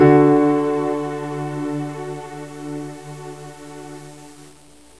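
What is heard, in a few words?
piano strings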